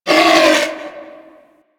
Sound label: Landscape Cave Creature Calls, Ambience